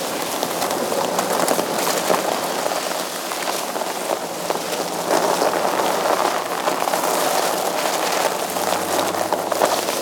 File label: Rover Tire Loop Snow; Warframe; Vehicles; Venus